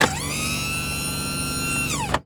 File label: Stretcher Raise Wheels; Medical; Blastwave